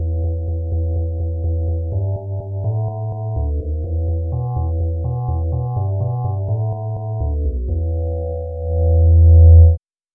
metal pad bass samples

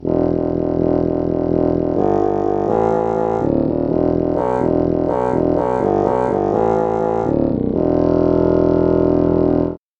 bassoon bass

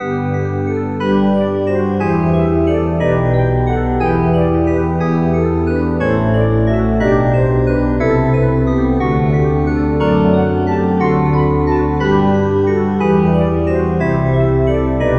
bowed glass

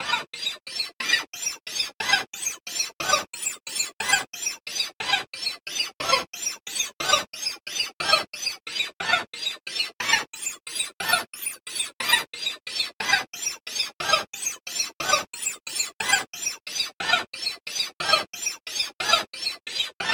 fret noise

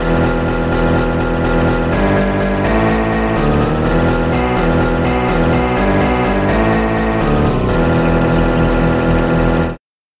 bagpipe bass

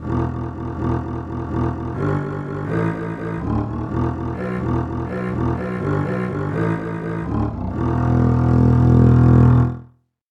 cello bass

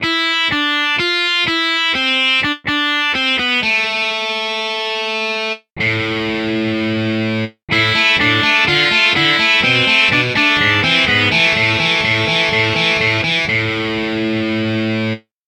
overdrive guitar

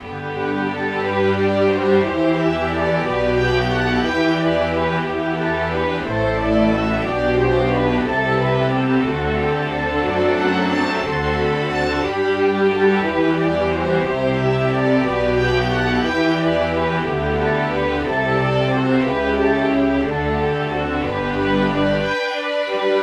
slow strings

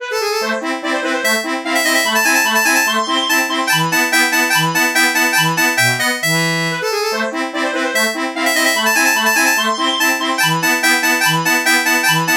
bandoneon

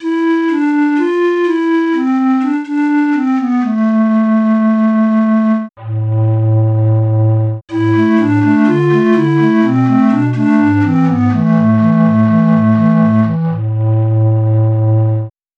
pan flute samples

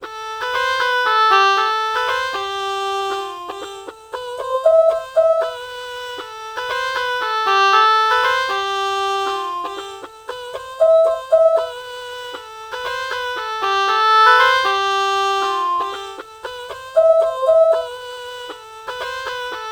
oh oboe melody morph

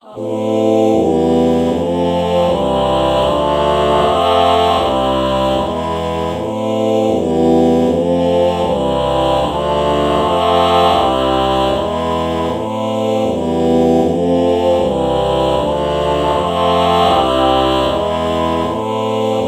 ah oh chord morph

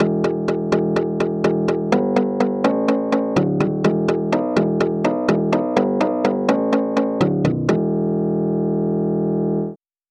shamisen bass

